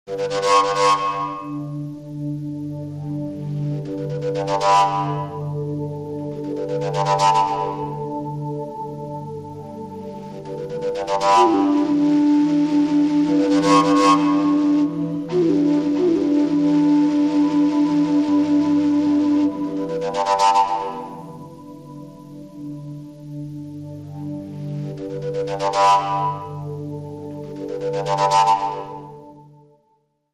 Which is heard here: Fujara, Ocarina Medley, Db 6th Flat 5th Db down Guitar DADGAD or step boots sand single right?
Fujara